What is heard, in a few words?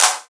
Clap; Drums; Claps; Best Service; House Definition VOL-1